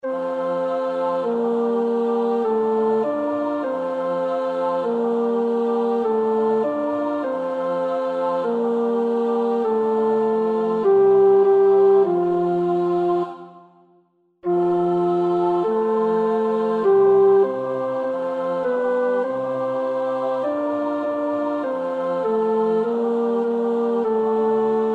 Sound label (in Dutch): tenor; Nieuw Liedboek; NLB 75